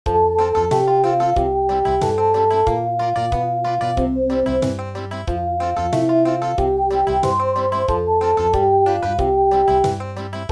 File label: Country, traditional